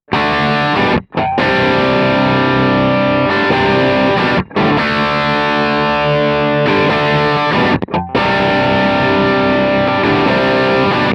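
crunch vintage, Guitar Dry Sound – Guitar Wet Sound – Guitar + Band; USA Vintage ’62+SD1 Crunch (Mic Pre Amp Harrison); From 0″ Guitar Dry Sound – From 14″ Guitar Wet Sound – From 27″ Guitar + Band; Kemper Profile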